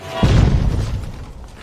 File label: a train sound